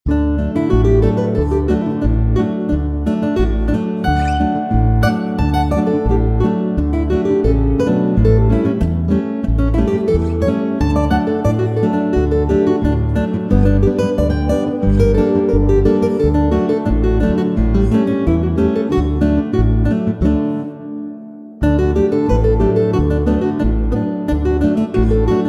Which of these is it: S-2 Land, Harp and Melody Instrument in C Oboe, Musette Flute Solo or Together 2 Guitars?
Together 2 Guitars